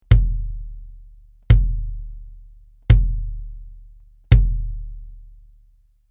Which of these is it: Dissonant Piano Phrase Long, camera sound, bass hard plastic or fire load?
bass hard plastic